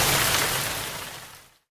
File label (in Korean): [Hit] 물 떨어저유; 音MAD; 少女终末旅行; 소녀 종말 여행 음원팩